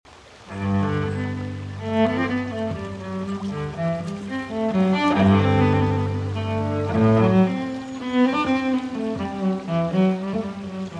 Unaccompanied Cello Suite No. 1 in G Major, Minuet; BWV 1007 V. Minuet